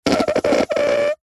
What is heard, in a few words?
leisure whoopee cushion squeeze fart strained whine med long